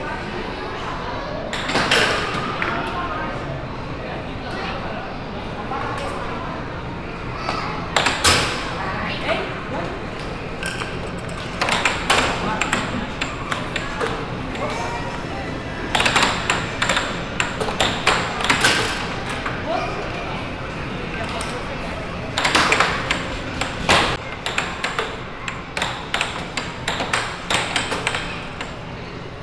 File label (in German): am Flipper; Fieldrecordings; Brasilien